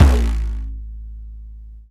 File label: INDIA PERC